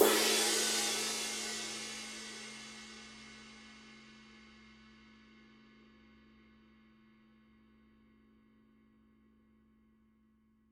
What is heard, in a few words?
20 medium flatride edge; formula 602 classic sounds